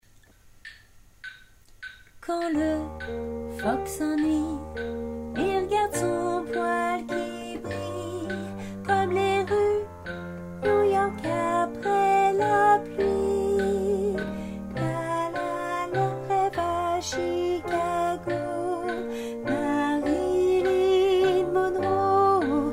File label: alto